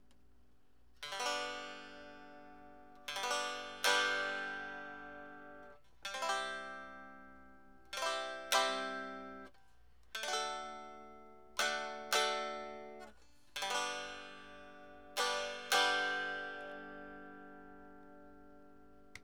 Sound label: brickhouse g acoustic